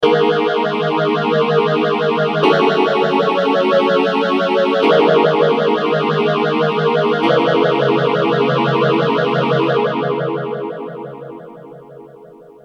m1000 boss ph3

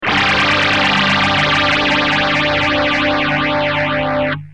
gtr multivox

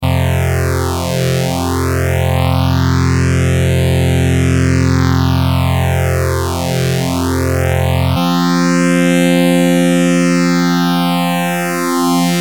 prodigy mutron phasor II

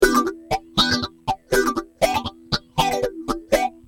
rhy gtr ph350

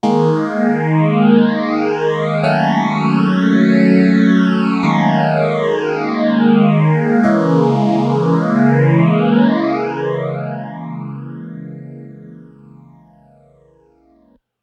Moogerphaser

